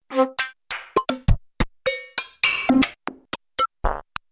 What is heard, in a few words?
pcm, roland jd800; synth